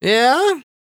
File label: walk